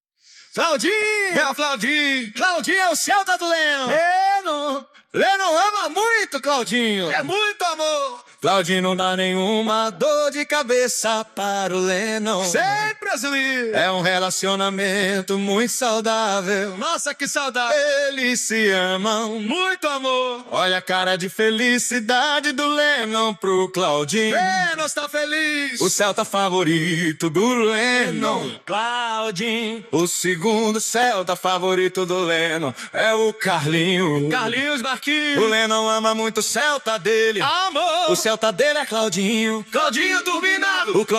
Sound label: vocals